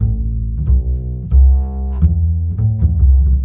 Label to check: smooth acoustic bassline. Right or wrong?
Right